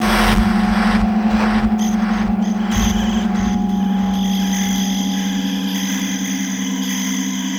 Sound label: ambience; Sonic Foundry; Sony Creative Software; Ambient Grooves